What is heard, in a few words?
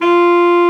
ALTO SAX F4; Greytsounds VOL-1